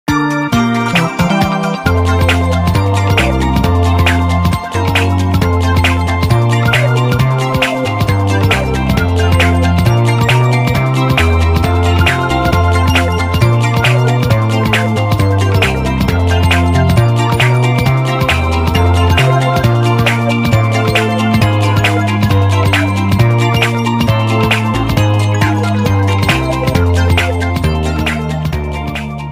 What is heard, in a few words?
Ground; Super Mario Maker 2; SMW